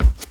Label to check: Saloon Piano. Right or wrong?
Wrong